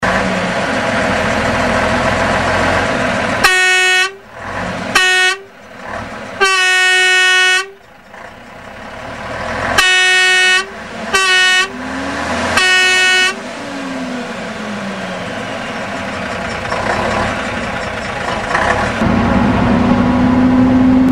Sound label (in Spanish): pegaso 1083 nuevos sonidos; ENASA